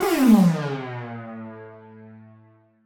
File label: Siren Horn down, Ramp Down, A; future rave